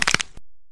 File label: bone break